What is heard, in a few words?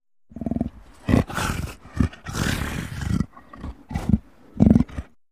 Leopard Snarl Raspy; big cats